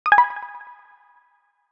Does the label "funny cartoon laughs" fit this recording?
No